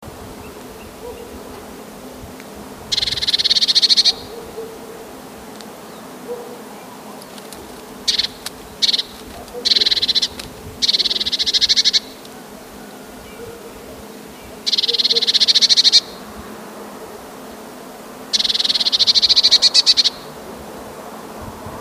modraszka, głos niepokoju prawdziwej sikory modrej; wroblowe; zapozyczenia

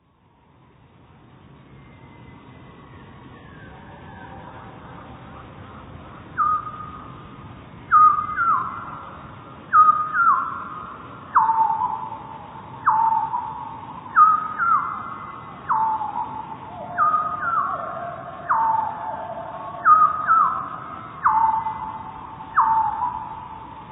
pierwiosnek, czterokrotnie zwolni i obniży o dwie oktawy; Na nagraniu, oprócz pierwiosnka, słychać wyraźnie śpiewającego w tle drozda śpiewaka

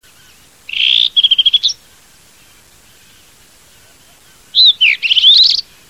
drozdówka rdzawa - Cercotrihas galactotes; ptak dotychczas w Polsce nieobserwowany; wroblowe; turdidae

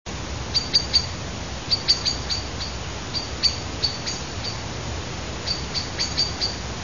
kos - głos alarmowy; wroblowe; turdidae; turdus

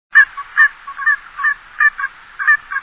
markaczka, głosy

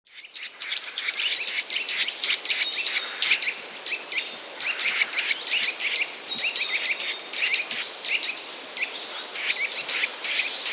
kowalik szczebiot, szczebiotu kowalika. Na nagraniu słychać też drugiego kowalika, który szczebiocze dźwięczn; Sitta europaea

